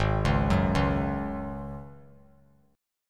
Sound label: G sharp 7th Suspended 4th G sharp; G#7sus4 arpeggiated; JGuitar